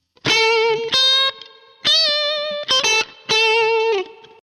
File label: BLUES LIK